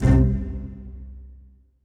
PIZZ, Dance Mega Synth

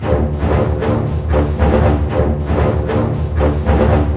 120 READY, Scoring Tools ORC Ready Fight

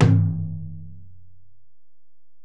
Tom, Alesis DM-PRO

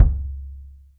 KICK, 3D Drums; DMAL KICK

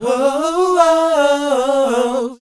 SHOO B, F#, Vocal Planet R&B & Dance; ILIO; Vocal Planet VOL-4; SHOO BE 80

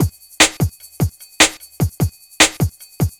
Beat, Futurist Drum & Bass; Drums & Percussion

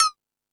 House Machine, Drum Machines

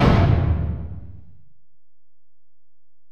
Tom, Alesis DM-PRO, Drum Machines, Toms

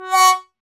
BRS BONEWA, Solo T.Bone; Roland L-CD702

